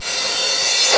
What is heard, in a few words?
Bass Crash, Jomox Airbase99